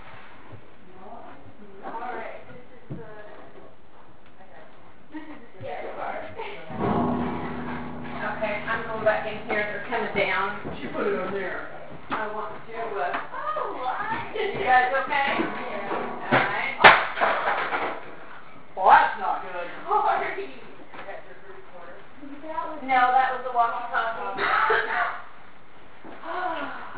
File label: piano pounding